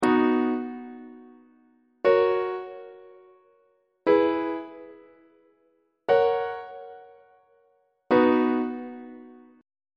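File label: c flat major inverted imperfect authentic cadence; on treble clef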